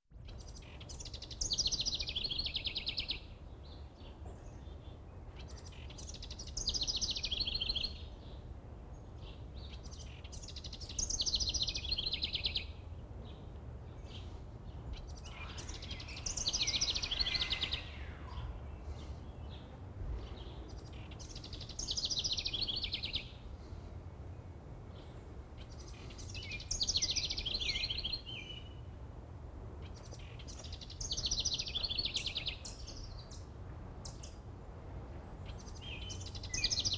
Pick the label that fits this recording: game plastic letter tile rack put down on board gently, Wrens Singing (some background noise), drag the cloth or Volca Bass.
Wrens Singing (some background noise)